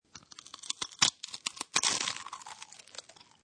Crisp Crunch Potato Chips Crisproll Crumble, eating